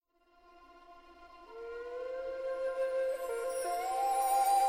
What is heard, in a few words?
Christmas Pipes